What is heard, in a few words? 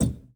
Aircrafts Cockpits Throttle Lim; DCS